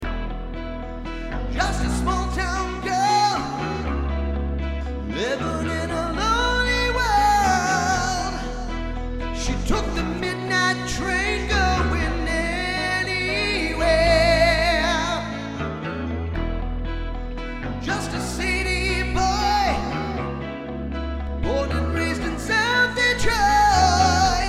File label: Cover Band